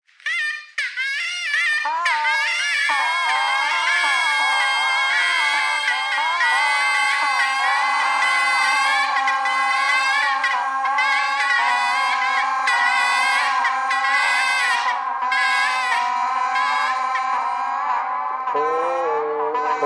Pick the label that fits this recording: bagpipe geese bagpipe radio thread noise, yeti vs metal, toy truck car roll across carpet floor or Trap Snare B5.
bagpipe geese bagpipe radio thread noise